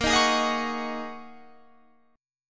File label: G sharp 7th Suspended 2nd Suspended 4th